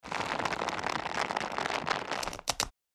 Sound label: Military rope tension; Military Equipment